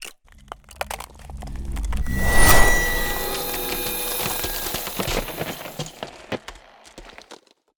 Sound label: Ice Spell Whoosh, Cold Whooshes; Arctic Aura; FrostBuster